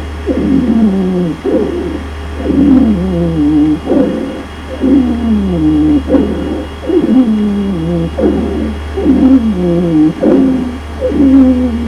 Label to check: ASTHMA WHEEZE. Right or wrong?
Right